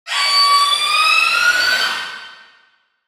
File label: wendigo angry, utterance